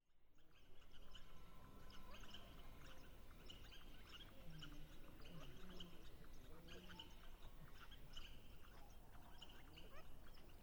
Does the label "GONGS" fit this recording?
No